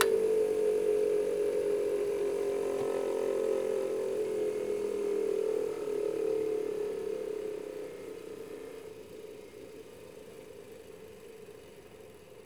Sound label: Grinder; INDUSTRIAL